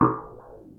Futuristic Button

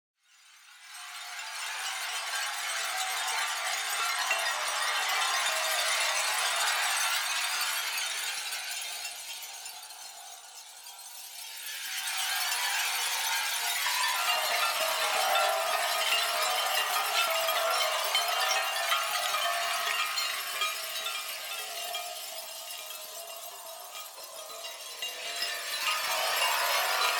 Crystal Waves

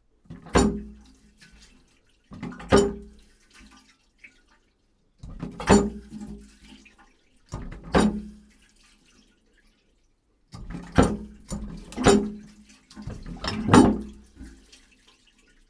Broken Stoneware Toilet Pulled

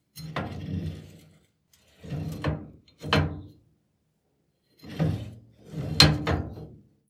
Metallic Door Open Close

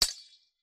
Sword clash

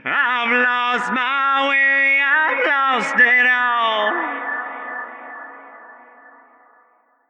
Vocal

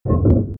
Horror Sfx

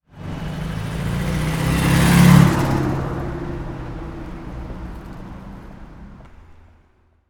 American Car Fast Drive Away

